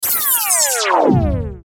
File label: Descend Laser Down; Descend Laser Static Down; Descending Sound Effects; Blastwave